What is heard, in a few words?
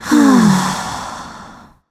breath; smite; tiamat